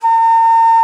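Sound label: alto bansuri, World Winds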